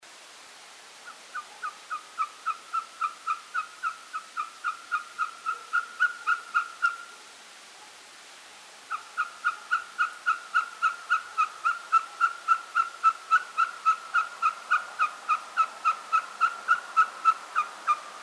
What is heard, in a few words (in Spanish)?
Mochuelo patagon Glaucidium nana